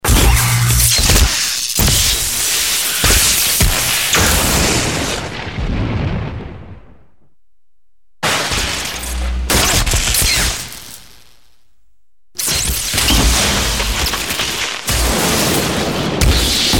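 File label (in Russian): взрыв искры большой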